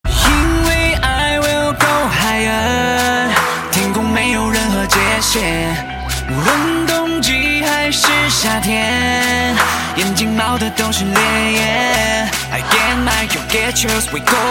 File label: On Fire Ringtone